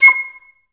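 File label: flute staccato C6; Best Service; Peter Siedlaczek's Advanced Orchestra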